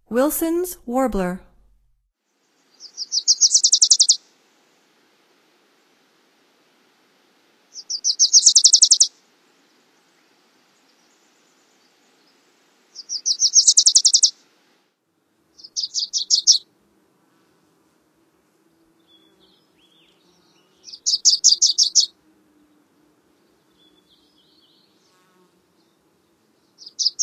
Wilsons Warbler, Songs of Birds of North America; Stokes

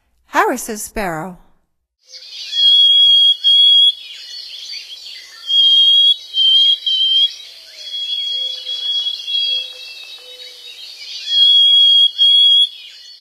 Harriss Sparrow, Songs of Birds of North America; Stokes

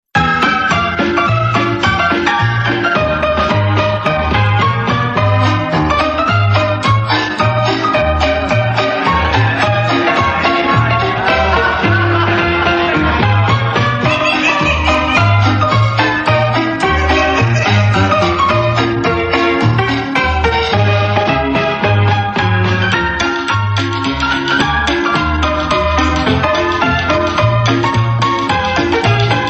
Piano, Ragtime Pianist

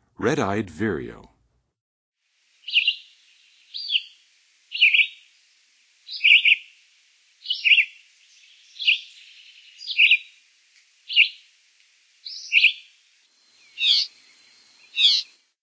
Red-eyed Vireo, Songs of Birds of North America; Western USA; Western USA Songbirds

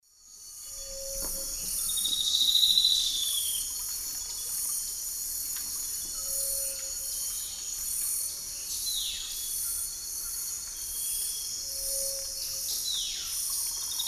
Schistocichla leucostigma, song and call; S. America; Eastern Lowlands

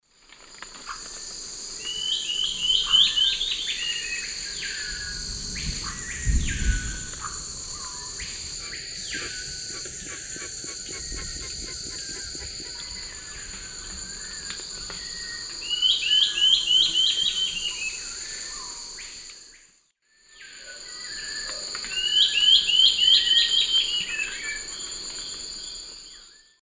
Gymnopithys leucaspis, song; S. America; Eastern Lowlands

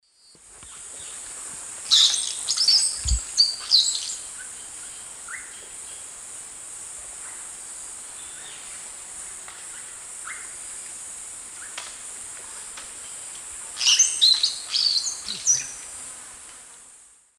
Molothrus oryzivorus, song; S. America; Eastern Lowlands

